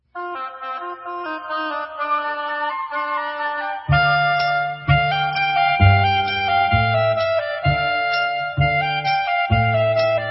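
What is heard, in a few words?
Instrumental, Israeli Dances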